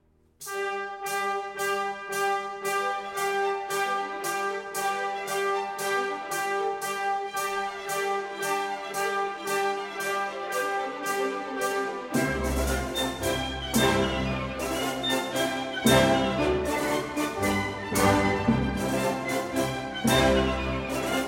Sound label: Arabian Fantasy; David; Le Desert